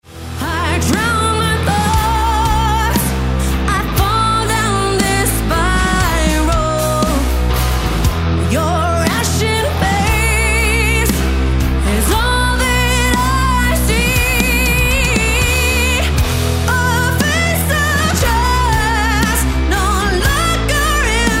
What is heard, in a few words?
Heavy Metal